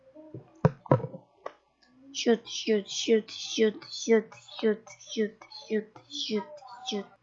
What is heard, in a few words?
shoot